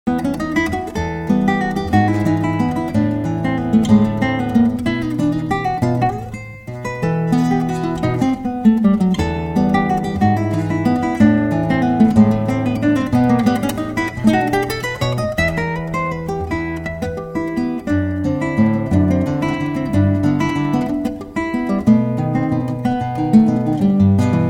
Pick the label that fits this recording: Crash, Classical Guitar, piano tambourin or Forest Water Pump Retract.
Classical Guitar